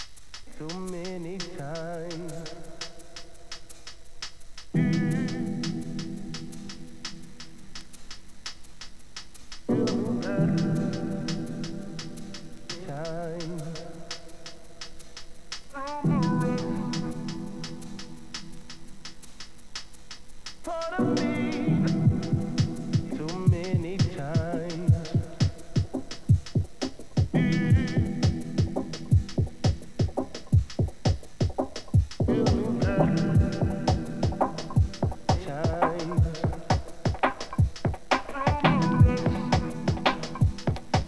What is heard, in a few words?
machinedrum gunshot